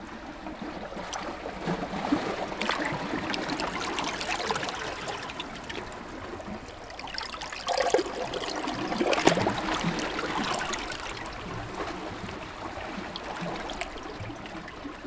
water dry no airspace; airspace hybrid reverb and delay; modeaudio

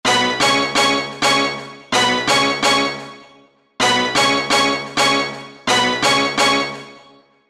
orchestra noble; the producer school

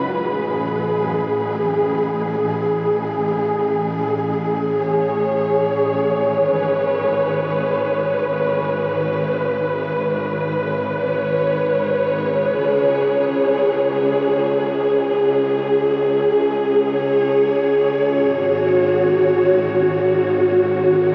synth pad dry; wavefield

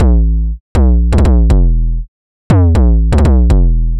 808 filth; ultimate vital bundle; rocket powered sound

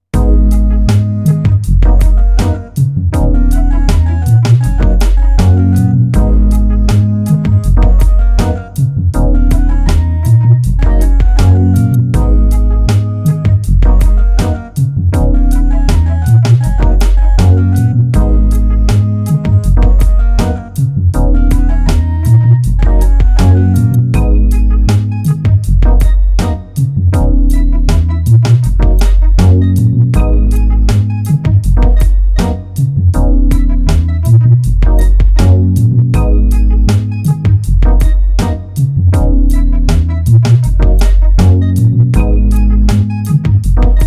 QY100 Inner Blues; downtempo

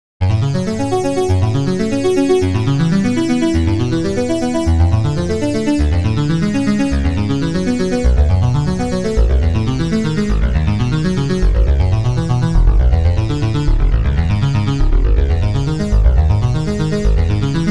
buff arp; the space soundset

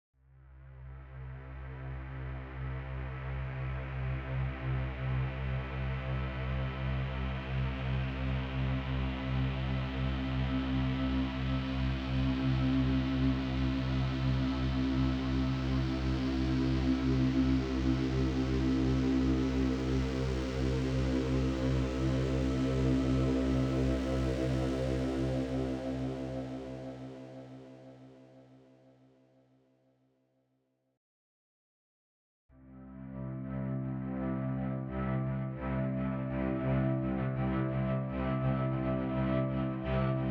pad

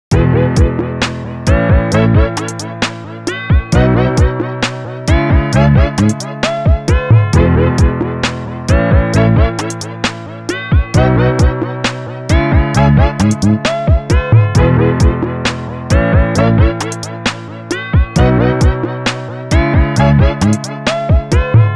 ramp down, vibrato

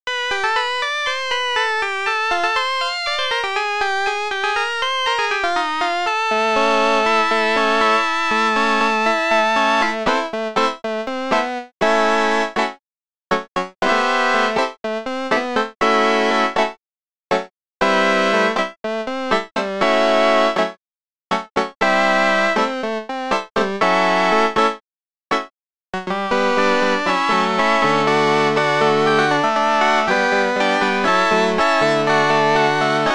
sweet 60s; agl vst customs

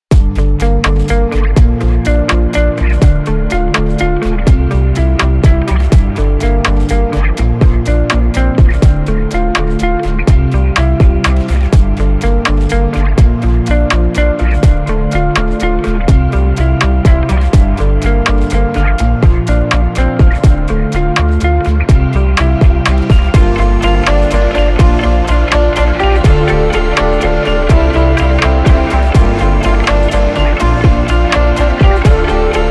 neural guitar; dr neural guitar v1; dead robot

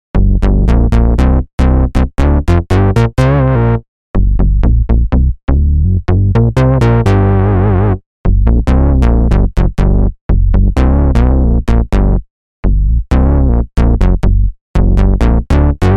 Saurus Moogus Funkus

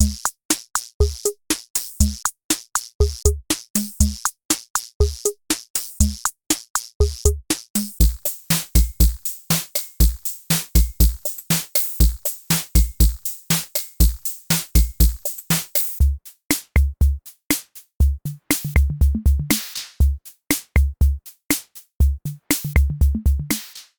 vintage drums; Tremor

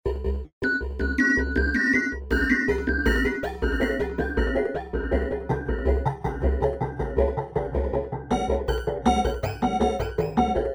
metal delay; sidekick M42

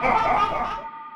musical chuckle male female la; nova sound

